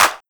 Clap; Pyrex; pitchglitch

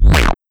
Glitch Whao

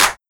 Clap; pitchglitch samples

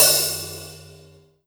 HiHat Open

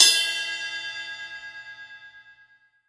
Cymbal Long; pitchglitch

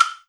Perc Wood Click

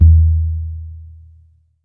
Tom Low

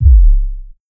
Kick; Gangsta Kick; pitchglitch samples

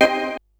Stab; foxdot default

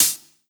Hi Hat Dubstep

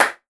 Clap Single; pitchglitch

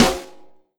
Rim Rimshot; Kevoy

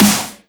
Snare Heavy; Toxemiccarton

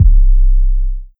Kick; pitchglitch samples

